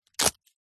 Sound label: paper raffle ticket book ticket rip out; foley; zapsplat